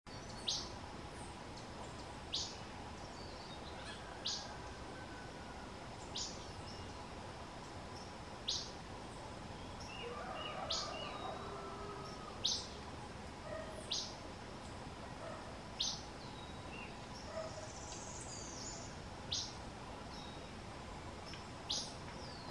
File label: Acadian Flycatcher Empidonax virescens